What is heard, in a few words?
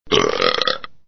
burp